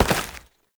Step Dirt, Foley; Warframe; Oraxia

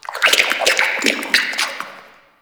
Flesh Tunnel Critter; Ambience; Warframe; Sentient Lair

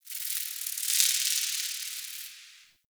Insect Wing Flutter; Ambience; Warframe; Nokko Colony

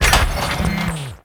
Garage Door Open; Gameplay; Warframe; Venus Open World

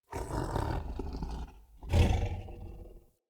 Battle Dog Snore; Snc Battle Dog

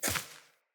Sparrow Step Default, Footsteps; Animals

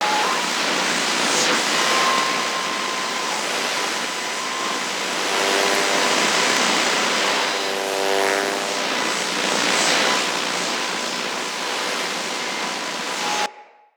Air Drop Loop; Vehicles; Liset; Liset Powers; Nora Liset

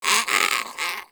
Rodent Vocal Tranq Dart Grunt; Warframe; Hunting Critters; Characters

